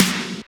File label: SNR DISCO, Snares; Roland L-CD701; Sn Modules 7